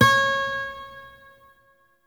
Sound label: Shawzin Oct Three C Sharp; Warframe; Lotus Shawzin